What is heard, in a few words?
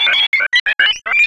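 sphere blips large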